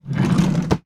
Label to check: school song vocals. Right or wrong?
Wrong